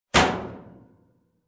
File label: Metal Door slam